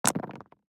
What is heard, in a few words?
DICE on Neoprene, Throw and Roll, Standard, 5 Five Dice; Samples; ArticulatedSounds; DICE Sfx Party (Extended)